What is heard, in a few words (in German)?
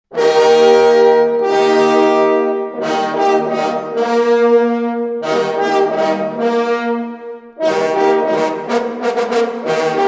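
HIRSCH TOT Jagdhornbläser; Graun; Hirschruf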